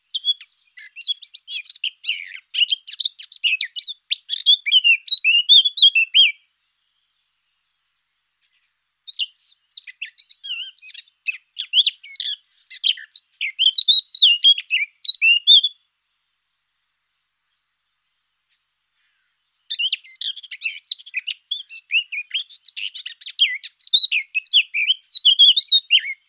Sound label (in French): fauvette a tete noire